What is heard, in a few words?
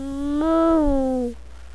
moo